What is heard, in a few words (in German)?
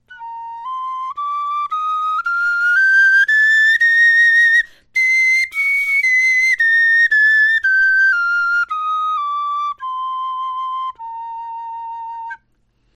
tonleiter, knochenfloeten